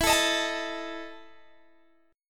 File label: E Major 7th Suspended 4th E down Guitar Open G